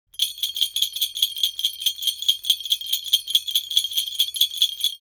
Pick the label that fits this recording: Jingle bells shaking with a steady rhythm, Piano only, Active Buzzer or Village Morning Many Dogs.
Jingle bells shaking with a steady rhythm